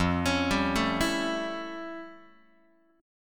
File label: F Augmented Major 9th F Guitar; F+M9; arpeggiated